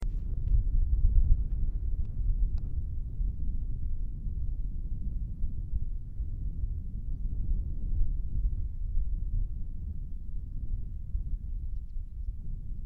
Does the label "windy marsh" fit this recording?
Yes